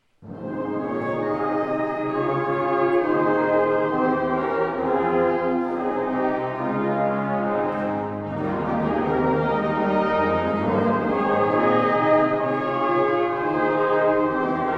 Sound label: falling leaves; Konzertmusikbewertung 2024; ortsmusik ollersdorf